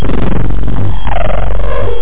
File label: BOING; Jason Aller Floppy Collection